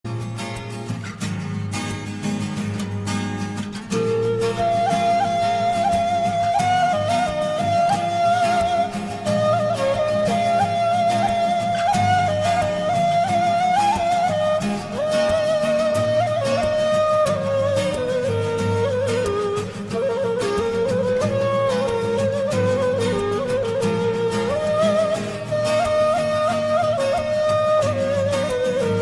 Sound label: guitar